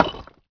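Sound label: Chunk Hit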